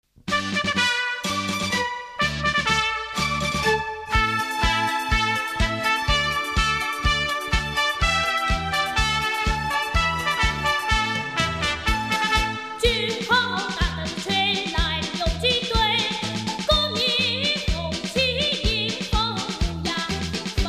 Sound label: Little Trumpet, chinese music; Children